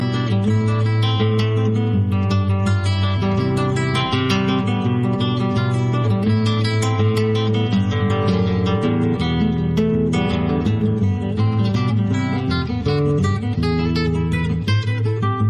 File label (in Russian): solo na gitare